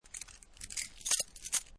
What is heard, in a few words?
Key Ring Movement, Rattle keys on a key chain or key ring; Jangling; LynneMusic